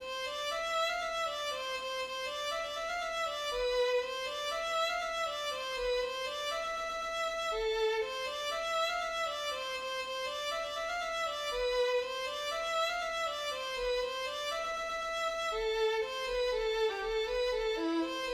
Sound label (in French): polka du lot